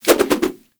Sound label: Nunchaku Spin Whoosh; Weapons; Warframe; Tenno